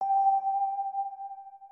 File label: organ